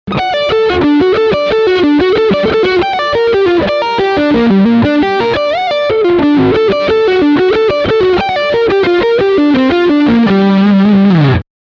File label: Leadline front singlecoil